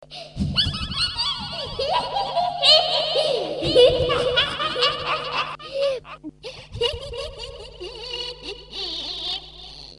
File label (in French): rire sorciere